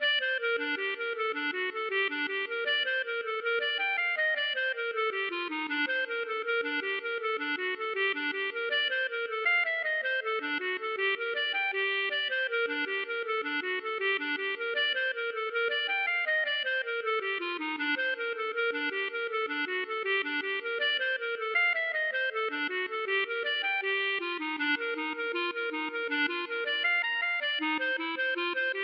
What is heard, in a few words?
hornpipe